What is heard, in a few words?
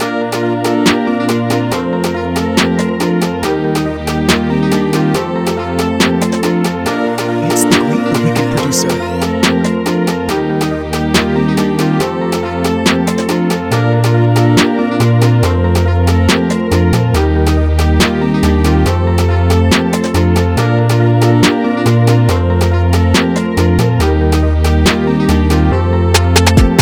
Afro drills, freebeat